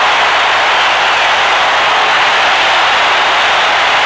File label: Idle, Crowds; XBOX; AND1 Streetball